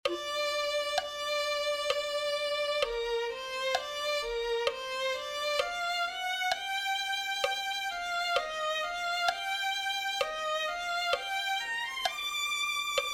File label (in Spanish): violin